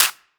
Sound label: Snares, Clap n Snap